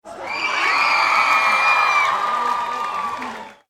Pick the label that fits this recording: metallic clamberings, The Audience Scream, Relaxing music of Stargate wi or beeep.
The Audience Scream